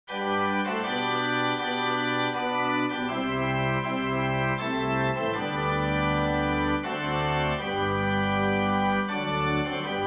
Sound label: praise the savior organ